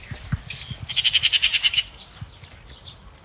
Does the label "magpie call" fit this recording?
Yes